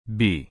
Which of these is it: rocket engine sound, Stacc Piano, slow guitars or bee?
bee